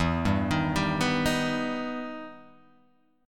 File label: E Minor Major 7th E down